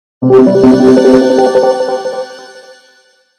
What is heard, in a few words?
Logo Pad Delay Shimmer Swell; Sonic Logo; Production Element Imaging Element Accent Transition; Blastwave